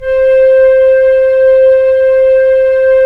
FLT FL, Flute; Roland - LA Composer vol. 4

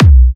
Kicks B; markus hakala

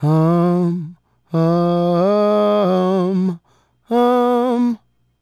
Male Solo, Hip-Hop and RnB Vocals

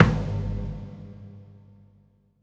Snare, Gong, Kick; Essential Percussion

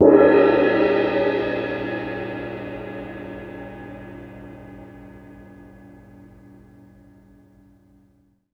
CYM P, Percussion; ILIO; Synclavier Percussion 2; World & Orchestral

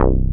BASS, SYN BS; AKAI S6000 CD-ROM - Volume 1; SAMPLE WAVE MIXING 2; SYN BS #3

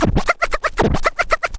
scratch tools; SCRACTH; Megabass Remix! 2

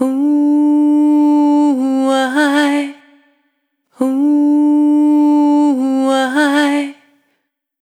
Single Voice Melodic Loops; Singles

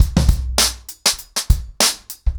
100BPM, ACOUSTIC DRUMS

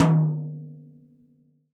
TD-20 Tom A, Roland TD-20 Toms

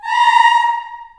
A#4, Choir, CHILD; CHILD IHS

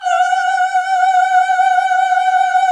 GOSP. Oooh, Roland; Sound & Vision - Gigapack II CD 1 (Roland); HAL Gosp. Oooh 2

